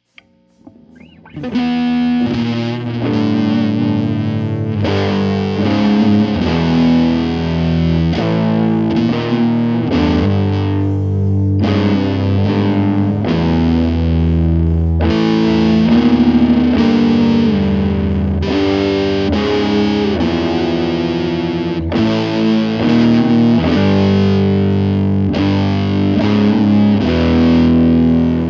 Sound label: Guitars